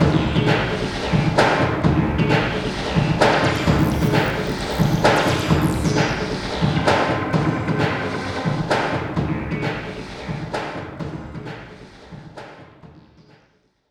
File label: F OUT, Tekno Industrial; East West